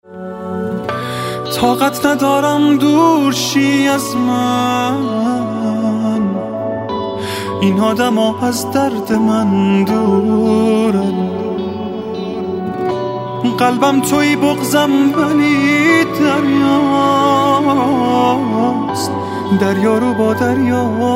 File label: Honeymoon Ringtone